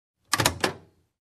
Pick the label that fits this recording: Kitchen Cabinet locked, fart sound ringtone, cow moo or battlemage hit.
Kitchen Cabinet locked